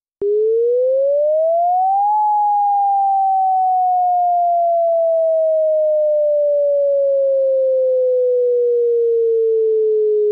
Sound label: Shelter Wail